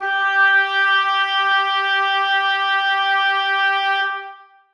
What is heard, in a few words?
oboes sus g4; Sonatina Symphonic Orchestra